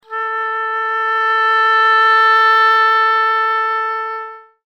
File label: oboe